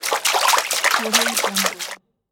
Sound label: water splashing